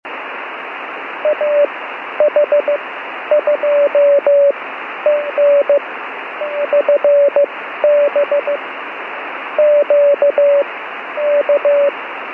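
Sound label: beacon, radio, upper sideband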